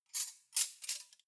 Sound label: cutlery knives handle